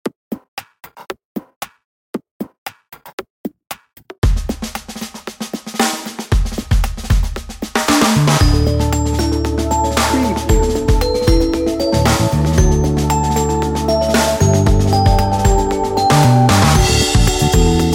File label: daytime talk bed